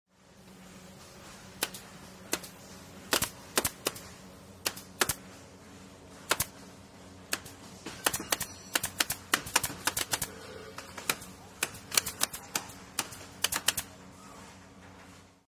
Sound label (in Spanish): Sonido Clavadora Neumatica